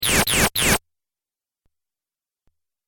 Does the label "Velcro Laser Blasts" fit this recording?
Yes